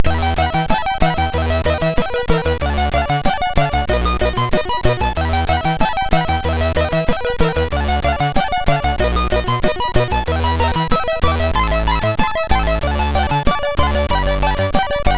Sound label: Classical sid MOS6581R3